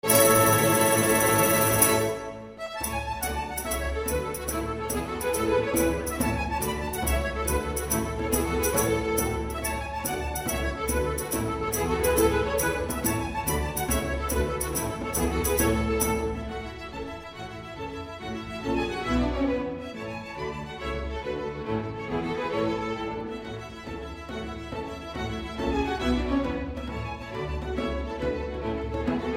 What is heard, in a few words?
jigs